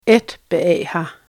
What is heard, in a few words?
scream